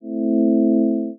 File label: synth pad